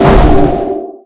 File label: strike